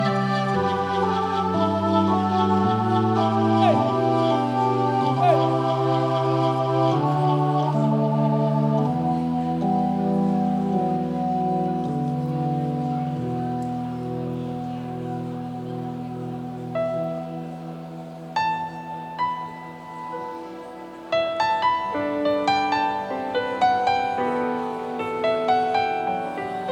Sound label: Organ Piano Intro